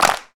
hand clap sound f key, Royality free clap single shot tuned to the F note. Loudest frequency: 2222Hz; percussion; single hits one shots